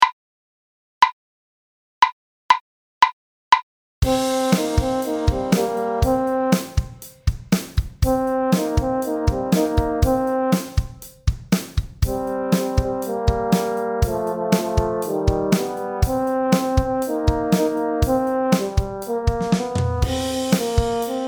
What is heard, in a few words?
quarantine rock